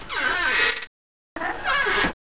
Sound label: crate squeak; ambience; poke646